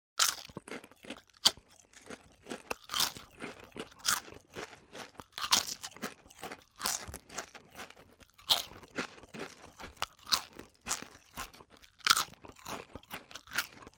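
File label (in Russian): быстро откусывает и ест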